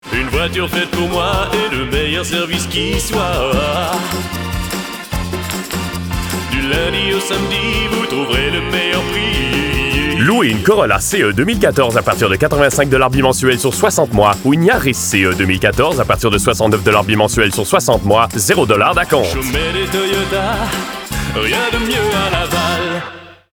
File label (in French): jingle radio humoristique chante annonceur; VERSION COURTE